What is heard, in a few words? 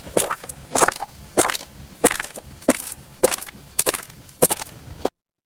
footsteps gravel